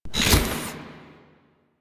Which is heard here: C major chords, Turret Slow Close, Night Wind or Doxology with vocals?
Turret Slow Close